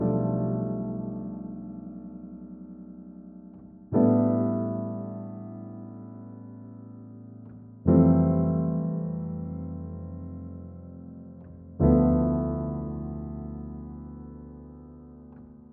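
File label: 122 BPM Piano Chord Progressions Cinematic Drama C major A minor Tonic A; MMB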